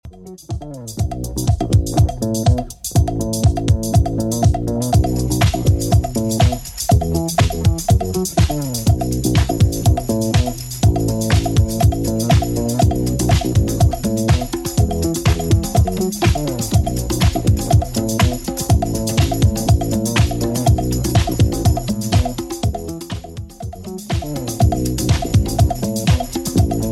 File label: 80s Redrum 122bpm